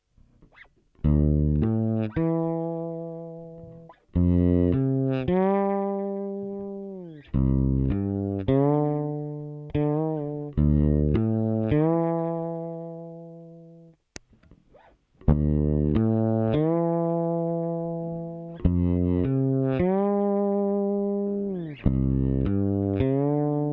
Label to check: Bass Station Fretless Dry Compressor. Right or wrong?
Right